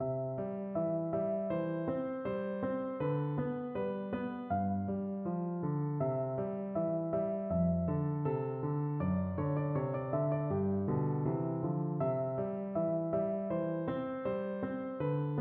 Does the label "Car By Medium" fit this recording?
No